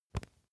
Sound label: match shake single